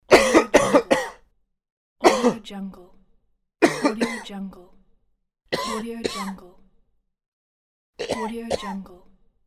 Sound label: man coughing